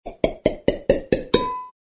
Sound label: comedy pop hop